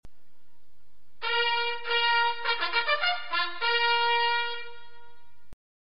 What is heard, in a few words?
Bugle Call